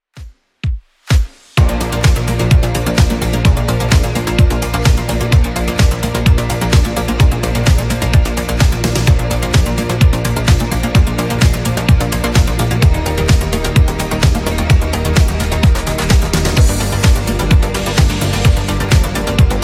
90s Redrum 128bpm

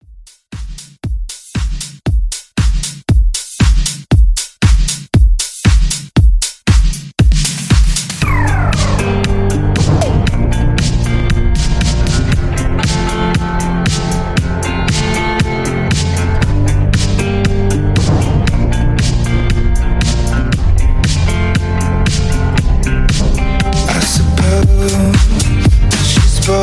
Extended House Redrum

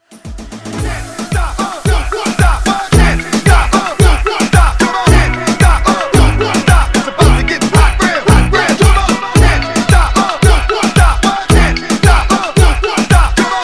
Reggaeton Kpop Redrum

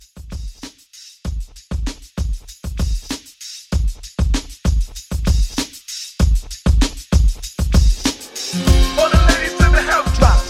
Hype Re Drum

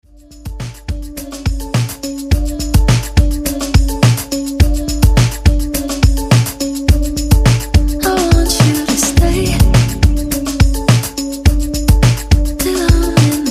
redrum breaker 105 bpm

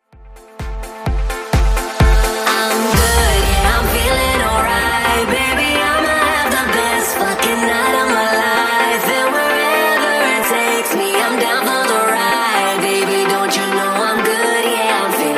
club hype

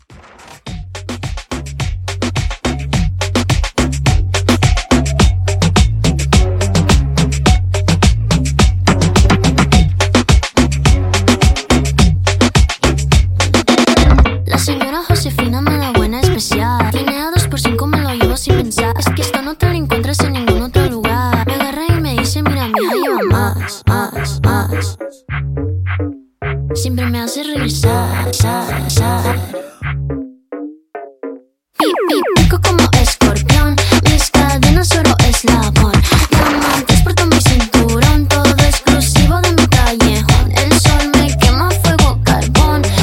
Latin Reggaeton; Mixshow Edit

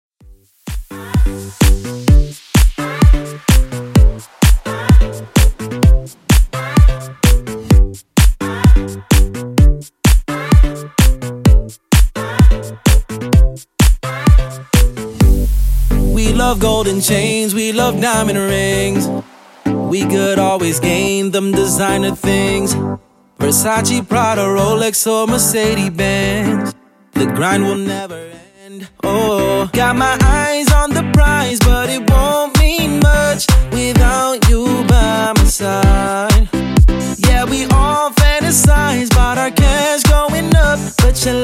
2ks DJ Friendly Redrum 128bpm

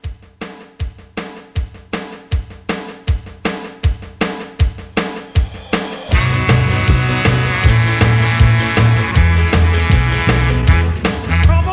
60s Rock Re Drum 158 CLEAN

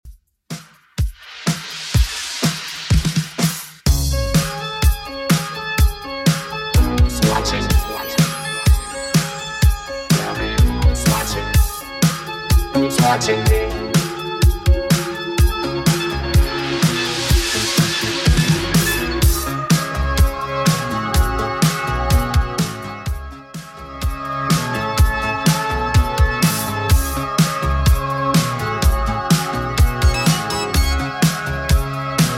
80s Redrum 125pm

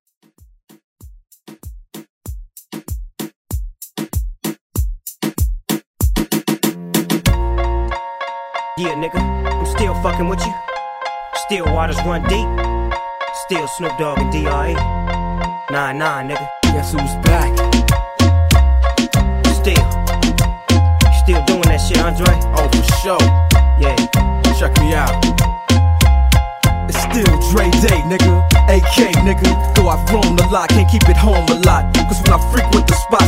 Reggaeton

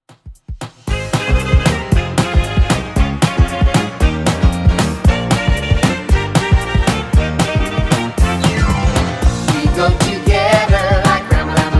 70s, 115 bpm; Redrum

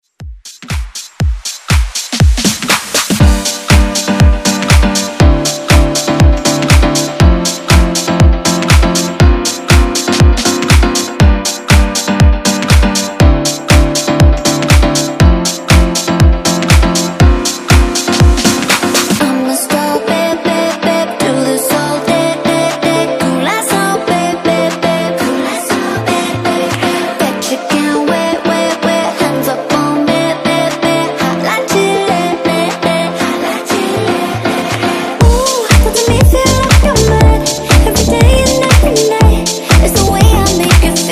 Dance Redrum

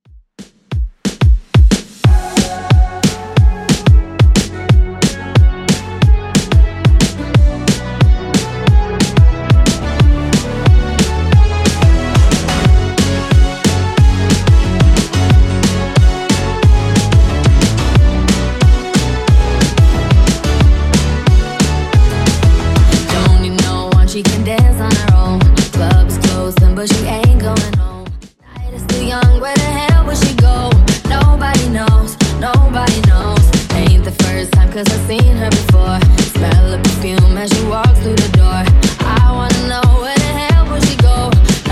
Top40 DJ Friendly Redrum 181bpm CLEAN

